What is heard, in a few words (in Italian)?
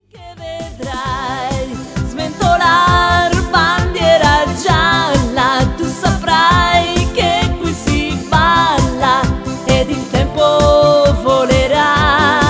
mix disco 60